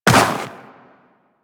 Walk Snow, Footsteps; Warframe; Tenno

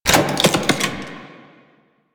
Hack Minigame Quit

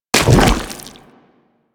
Nechro Mech Step Surface Goo; Step Surfaces

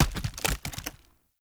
Tree Dead Small Destroy Chunk; Objects; Destructible Trees

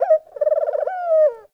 Forest Open Glade Bird Med, Day; Ambience

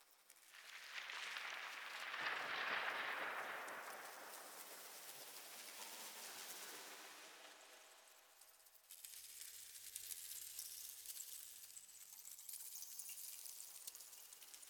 Gold Dust Atmosphere; Sacrifice; Vitruvian Sequence; Warframe

